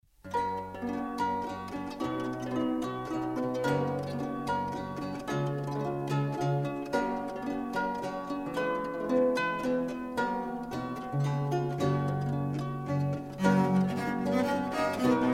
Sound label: Ricercare G-dur; Diego Ortiz